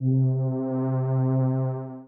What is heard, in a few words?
string